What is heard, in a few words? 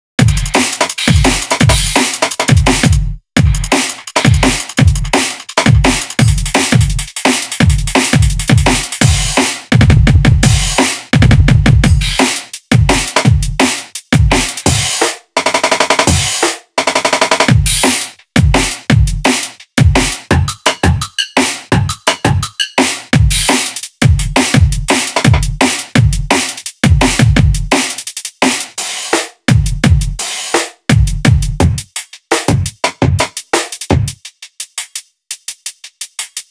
SAMPLES DRUM BASS, drums loops; SCM MUSIC